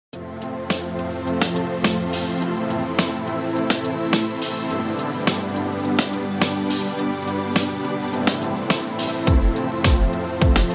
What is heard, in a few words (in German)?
musik hoerprobe